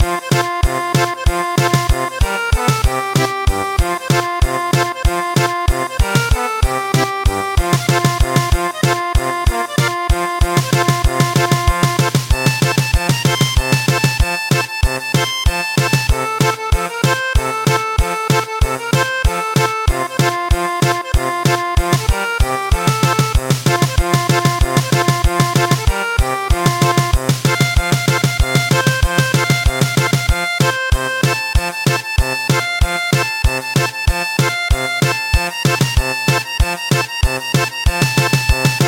German Polka

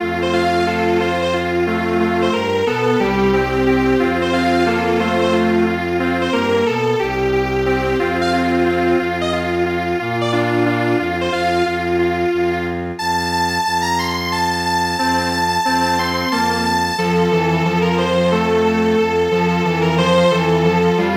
Strings Quartet; From The Four Seasons Autumn

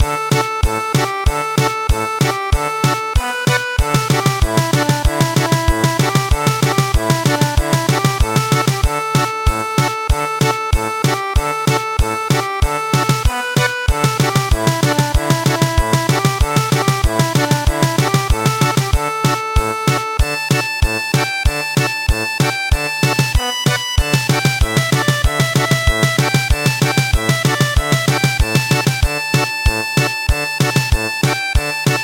Japanese Processional Tune German Polka

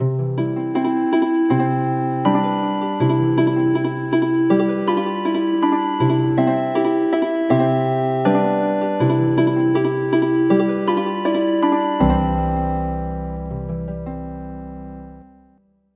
Healin Harp; traditional; O che bel castello

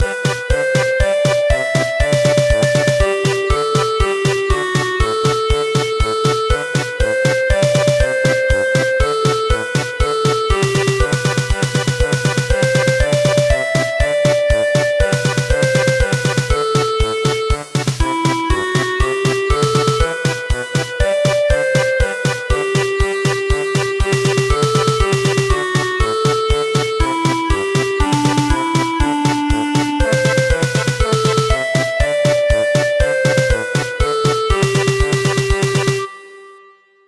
German Polka; We love the heroes

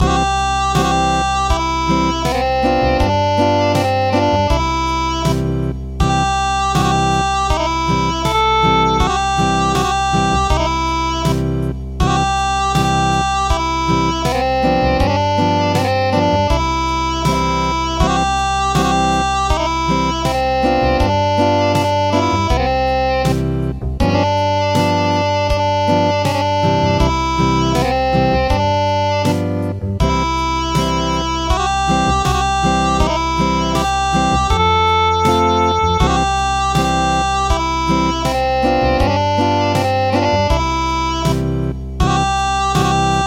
Acoustic; Chick

